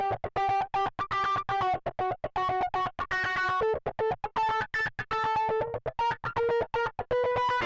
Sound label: guitar flanger; souldriven; Morphdrive